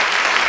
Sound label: APPLAUSE; AKAI S-Series CD-ROM Sound Library VOL-8; SFX